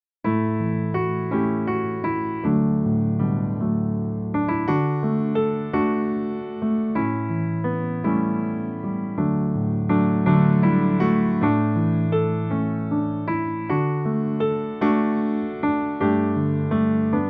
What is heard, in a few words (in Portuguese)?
Quem Sou Eu (Backing), playbacks musicas criancas; primeiros passos